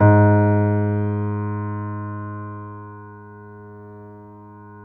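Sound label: G#2 L, GRAND PIANO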